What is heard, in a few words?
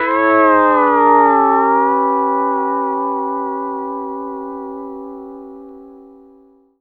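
pedal steel chord A9 L; PEDSTL CHORD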